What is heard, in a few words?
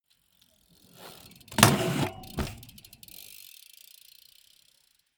bmx maneuver arrive grind drive off